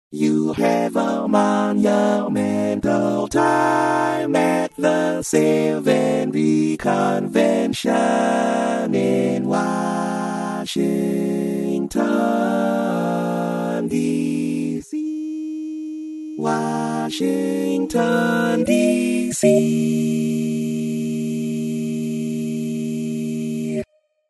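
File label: Baritone, barbershop tags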